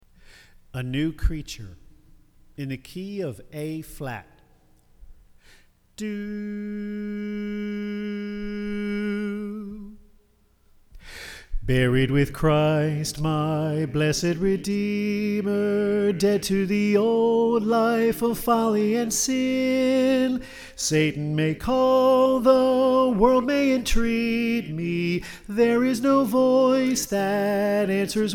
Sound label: Soprano